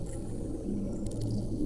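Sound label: Music Dpad Evaporate 145; from dust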